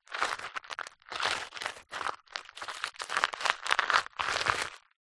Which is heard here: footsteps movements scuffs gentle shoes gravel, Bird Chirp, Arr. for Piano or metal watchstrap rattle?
footsteps movements scuffs gentle shoes gravel